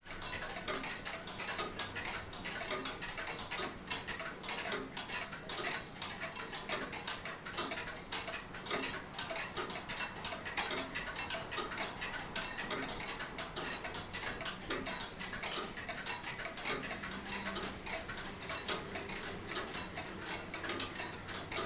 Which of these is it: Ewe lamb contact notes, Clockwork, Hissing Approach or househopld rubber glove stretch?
Clockwork